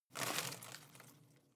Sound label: home plant leaves rustle regular short